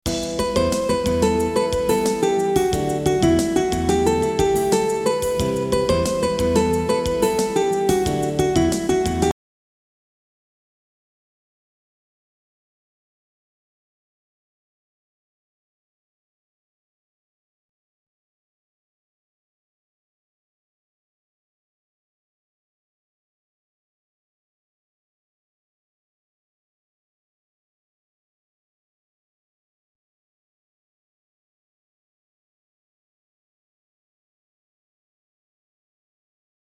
片头音乐

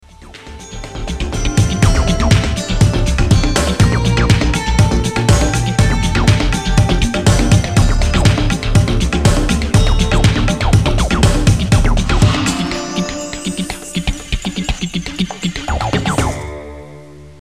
片头音乐

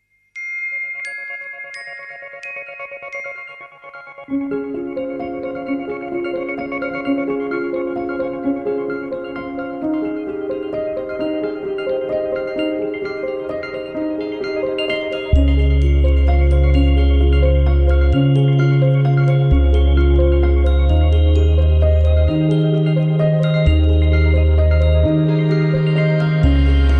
微电影背景音乐

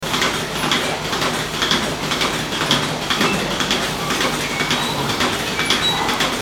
片头音乐

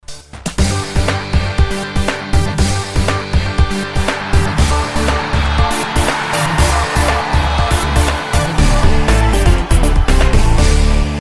欢快的背景音乐

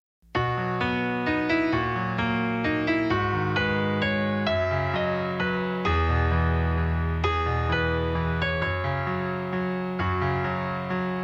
纪录片背景音乐

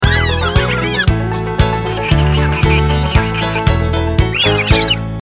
电视包装音乐

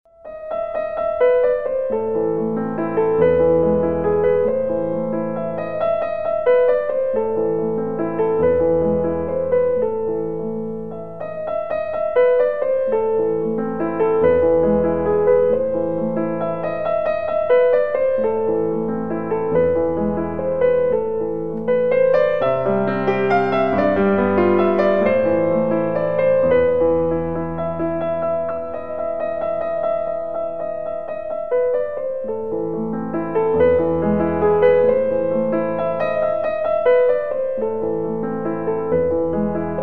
彩铃背景音乐

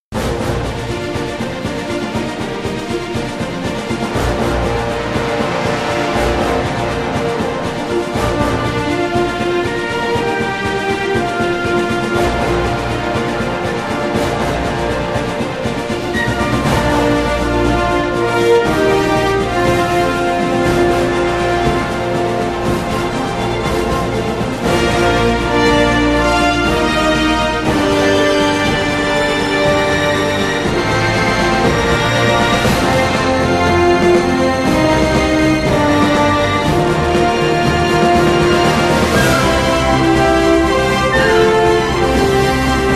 豪迈的背景音乐

